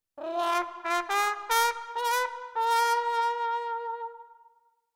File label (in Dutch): Plunger of wah wah trompet